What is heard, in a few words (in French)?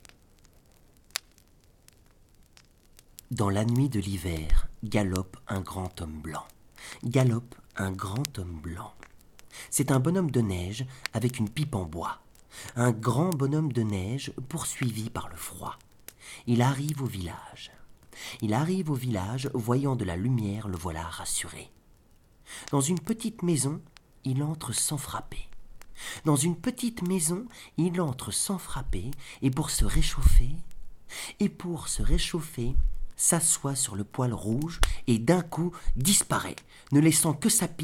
chanson pour les enfants